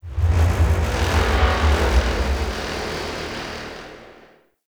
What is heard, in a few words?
Corpus Ship Rumble; Warframe; Corpus Ship Remaster